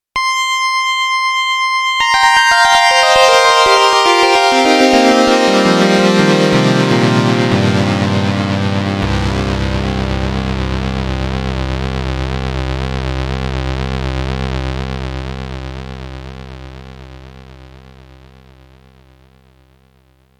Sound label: STREICHFETT